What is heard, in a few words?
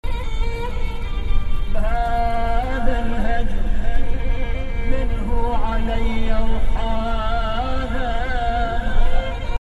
loop